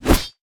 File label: snap trap; LoL; caitlyn